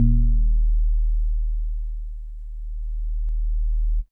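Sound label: House Organ DX100 D#3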